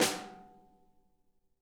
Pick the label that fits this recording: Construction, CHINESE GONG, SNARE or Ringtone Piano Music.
SNARE